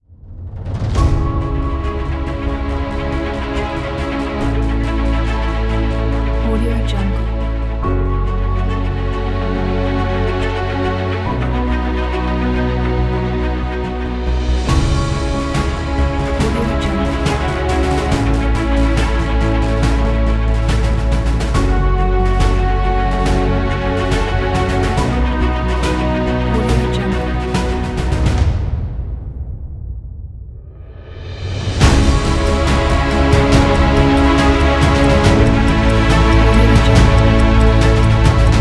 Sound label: epic dramatic trailer